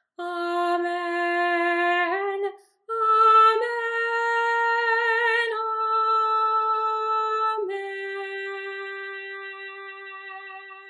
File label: Doxology Soprano; Amen